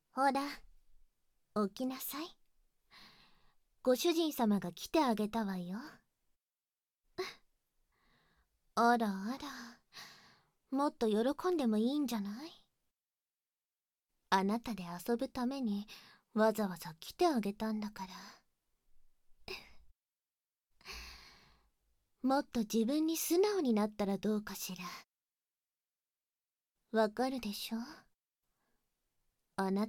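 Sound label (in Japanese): 左右耳舐め・正面囁き（バイノーラル 効果音あり大きめ）, ASMR; 耳舐め強制オナニー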